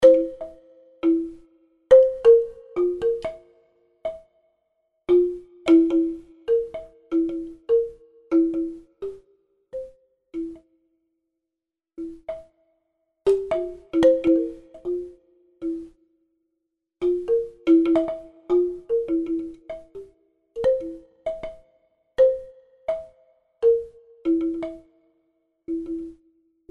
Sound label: Wind Chimes big bamboo